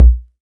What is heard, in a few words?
strong bottom end bass drum sound b key, kick drum tuned to the B note